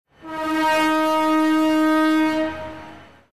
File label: train; Garry's Mod; tf2; mvm warehouse